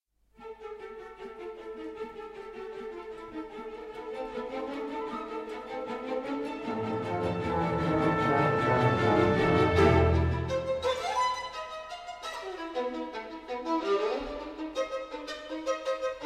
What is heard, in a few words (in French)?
Concerto pour violon et orchestre Allegro assai